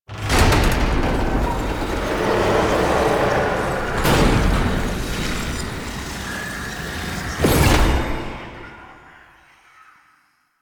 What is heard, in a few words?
Extraction Cinematic SFX; Warframe; Entrati Labs